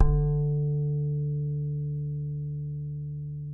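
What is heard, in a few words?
acoustic bass harmonic D 3; Masterbits CLIMAX 7 - Bass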